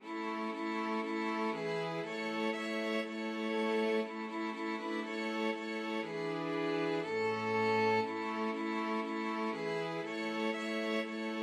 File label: string quartet; blood of jesus